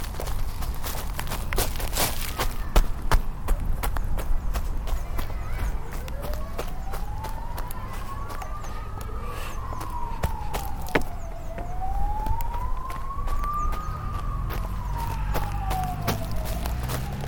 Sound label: police